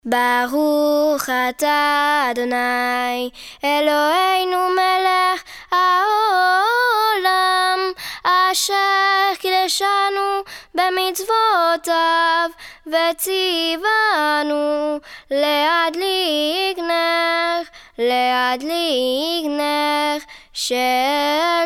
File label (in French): enfants, bénédiction des bougies de chabbat